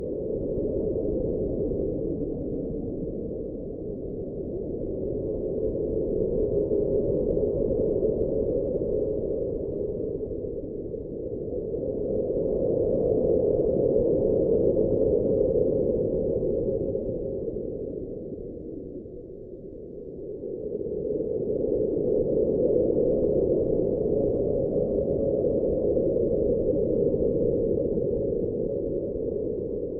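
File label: background music; Unity; Arctic Escape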